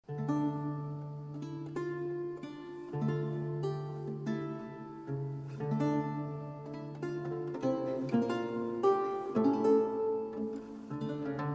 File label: Nocturnal Midnight, Lute music; John Dowland; Lute songs